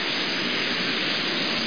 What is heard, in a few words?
WORM; starmerc